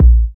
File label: BD hip, Dance Mega Drums; Best Service